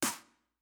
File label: good addon